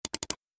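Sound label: double click of a computer mouse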